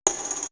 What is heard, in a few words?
Tambourine; Coldcut's Kleptomania VOL1